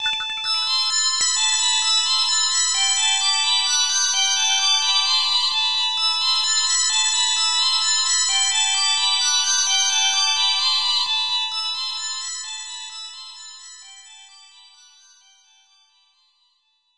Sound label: Heal Magic; Little Master; SNES